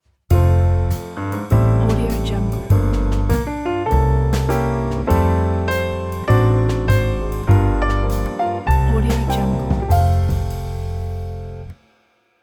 jazz piano logo; mezzosound

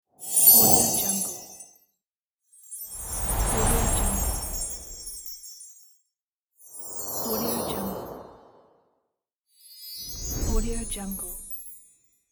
christmas transition whoosh

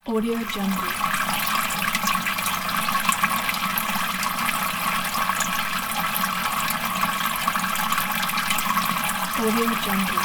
water drop

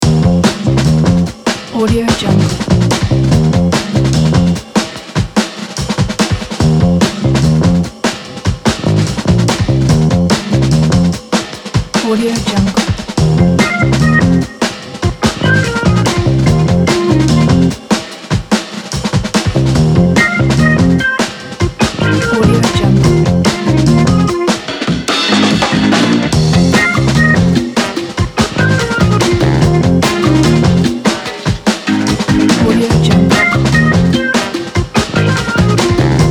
vintage funk breakbeat; guitarsstate

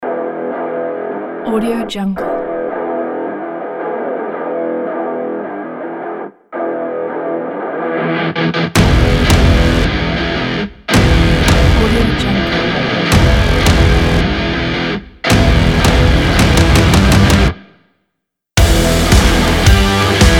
action sport rock kit

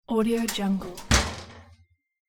oven door closes with ring off; hollywoodedge

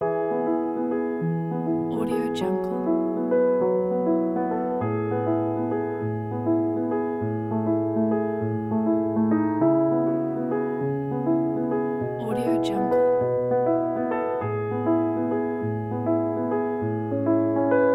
the romantic inspiration

